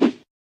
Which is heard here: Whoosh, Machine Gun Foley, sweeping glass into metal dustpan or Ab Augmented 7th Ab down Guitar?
Whoosh